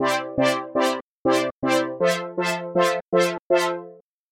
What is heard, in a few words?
synth1 chorus